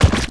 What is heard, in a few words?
siorava attack